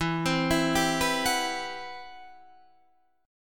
C Major Sharp 11th E

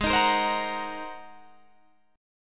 G sharp 9th, Guitar, Open D

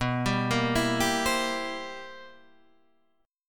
C 7th B, C7/B chord; arpeggiated; JGuitar

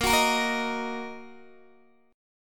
Bb Suspended 4th Sharp 5th Bb down Guitar DADGAD

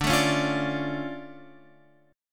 D Minor Major 7th D down Guitar Open F; DmM7 strummed; JGuitar

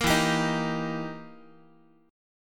E Minor Major 7th Flat 5th, E, down, Guitar; strummed; JGuitar

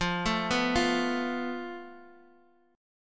F Major Flat 5th, Guitar, Open D; x,x,3,3,2,3; down; chord shape

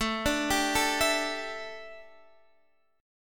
A 7th Suspended 4th Guitar Open D, chord

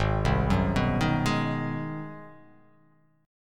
Ab 7th Sharp 9th down, chord; arpeggiated; JGuitar

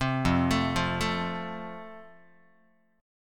F Major, Guitar; arpeggiated

